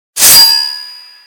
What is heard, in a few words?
knife; jocul fructelor